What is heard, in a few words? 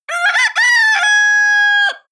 cock call close